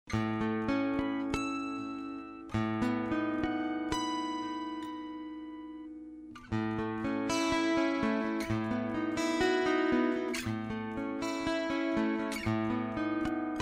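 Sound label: Chorus mixed with fade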